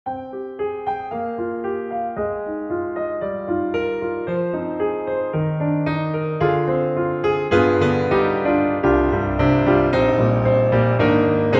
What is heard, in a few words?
Bosendorfer Master DYN; EastWest; Quantum Leap Pianos